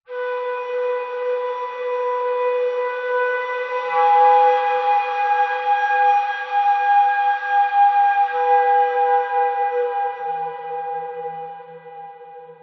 horror droning airy whistle blow atmos dark mysterious; zapsplat